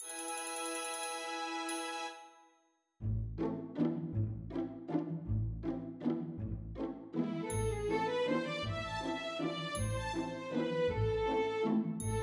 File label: Anitras Dance orch